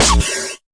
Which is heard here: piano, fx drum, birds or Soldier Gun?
fx drum